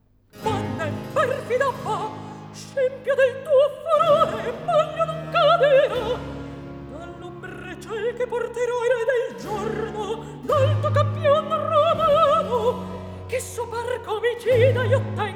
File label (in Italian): Recitativo; Antonio Vivaldi (1678 - 1741); Vivaldi - Tito Manlio (2005); Atto 2; Scena 18; (Lucio); Vanne, perfida, va